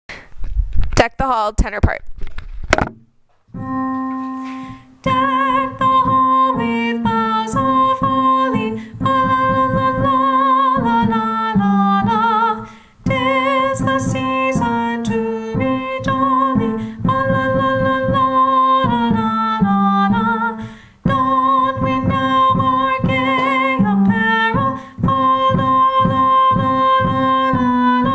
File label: deck the hall tenor